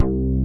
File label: SYN BASS; ZERO-G; Datafile